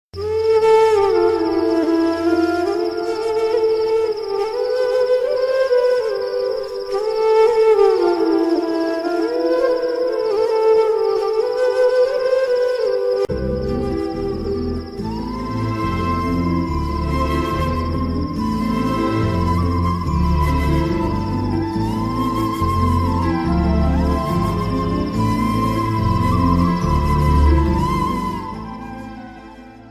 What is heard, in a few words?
sad bgm ringtone; Sillunu Oru Kadhal